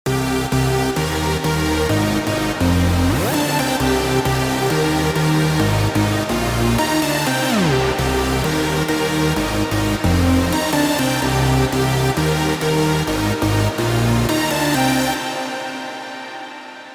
Reverb - Synth Lead - Bright Cathedral; CRTIV Reverb; Synth Lead 1

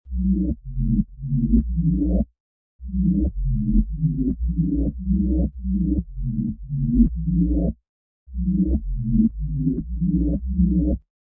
Chorus - Bass; CRTIV Chorus; Reason Bass - Mish-Up

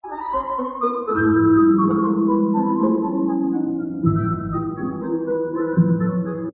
Orchestra - Denoised; Redunoise

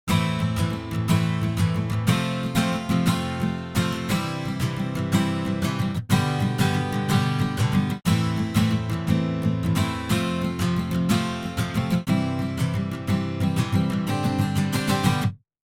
Reverb - Guitar Strumming; CRTIV Reverb

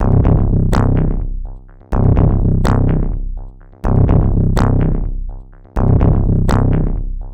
Bass Loop; Shinechilla; HiWhack

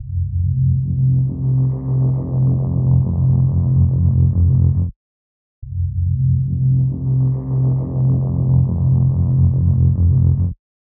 Pad FX; Shinechilla

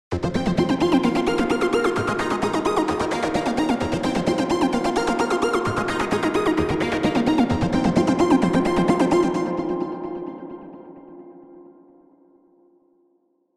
Arp Synth - Vintage Plate; OldSkoolVerb

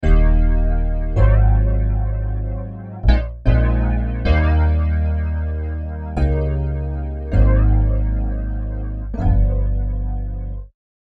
Chorus - Electric Piano - Boxy Flange; CRTIV Chorus

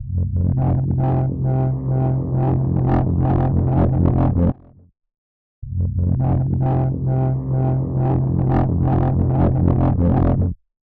Pad FX - Pad Roar; Shinechilla

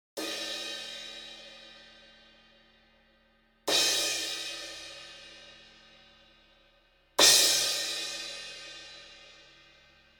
Crash Cymbal 18 - Hickory Sticks

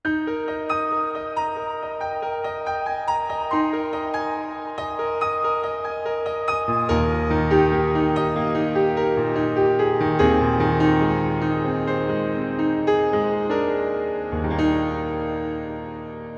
Reverb - Piano - Long and Bright; CRTIV Reverb

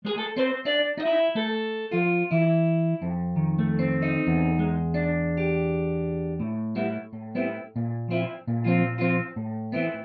Jazz Guitar On The Stage; Spatifier

